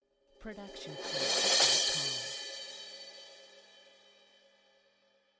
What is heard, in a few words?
crash cymbal 16 inch swell sticks short; soundscrate